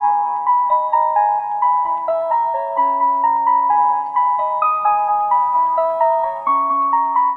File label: Melody Loop 130 BPM G# Min Bells; Melodies; Loop Stems; Cymatics; Oracle Sample Pack; Oracle Various Melody Loop 9